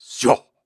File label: Grunts and Chants, Viking Vocal